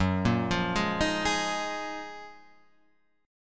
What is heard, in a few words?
F sharp Major 13th Guitar Half Step Down